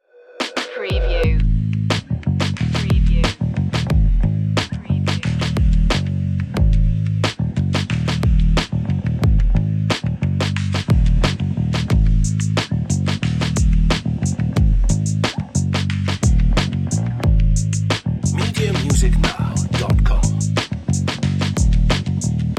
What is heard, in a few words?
drums and bass; enchanting